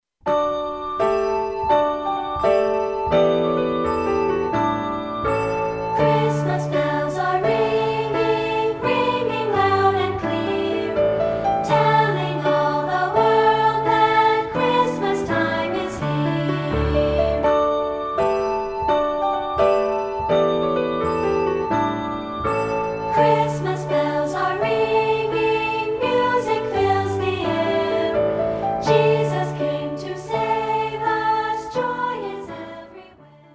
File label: christmas bells ringing